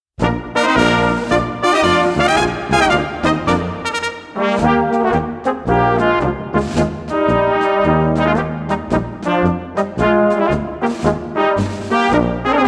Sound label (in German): Polka